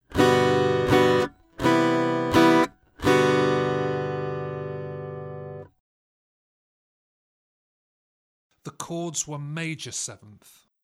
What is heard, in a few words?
Aural Harmony Test, acoustic guitar; Grade 3